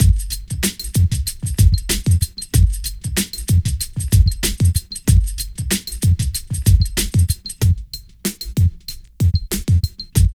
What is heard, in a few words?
PHAT LOOP; E-Lab; X Static Goldmine 2; akai